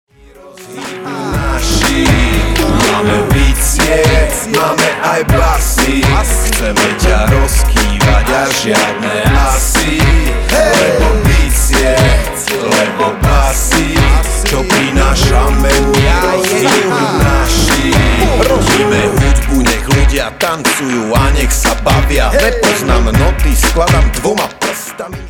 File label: swing